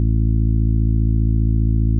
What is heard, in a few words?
55kHz -6dB